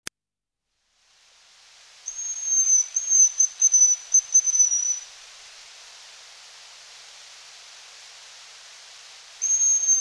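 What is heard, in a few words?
hazel grouse voice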